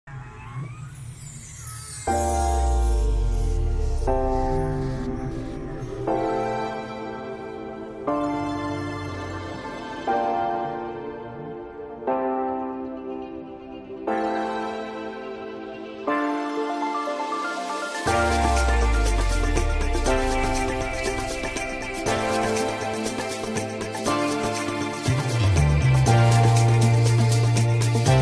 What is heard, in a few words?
Future Funk